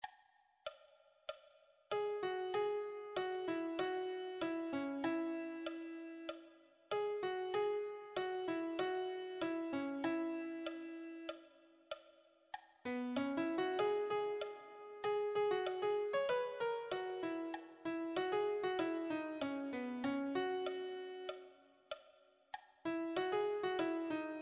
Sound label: melody, piano